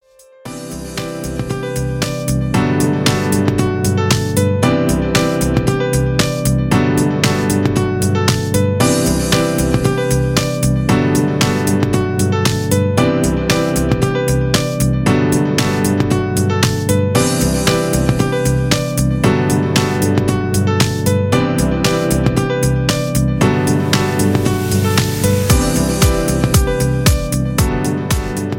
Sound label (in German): musik hoerprobe